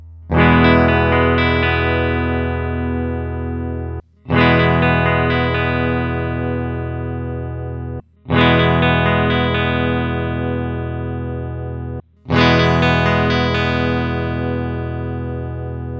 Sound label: hb ser split bridge strum